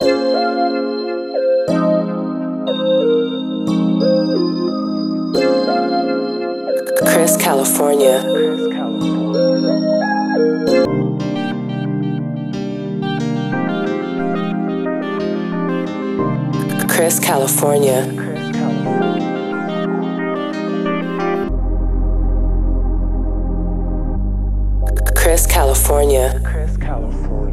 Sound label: loop; chris californiaa on this side loop pack vol 1